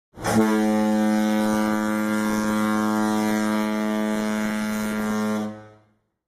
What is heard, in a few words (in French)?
Corne de Brume